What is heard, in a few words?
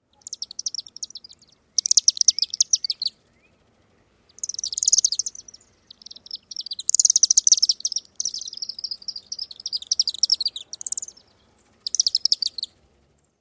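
Chimney Swift chittering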